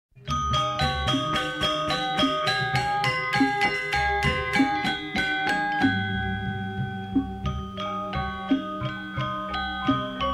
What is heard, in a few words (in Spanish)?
Metalófonos